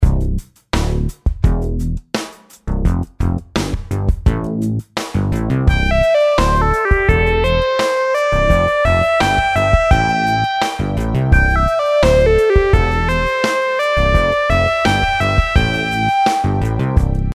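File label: melodies simple 8s triplets C major; melody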